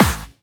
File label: Snares & Claps; Vengeance Samples